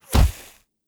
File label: bullet impact sand; Rust